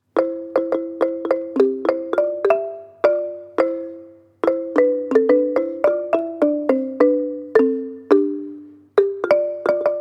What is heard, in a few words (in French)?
xylophone; c'est la mere michel